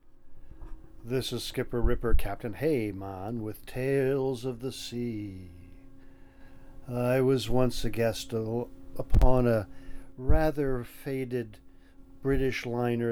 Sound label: sitar shimmering sunset